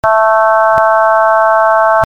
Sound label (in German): saegezahn 220 bandpass